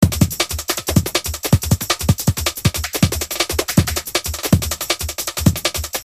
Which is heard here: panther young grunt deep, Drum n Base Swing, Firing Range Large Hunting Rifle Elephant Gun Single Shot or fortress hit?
Drum n Base Swing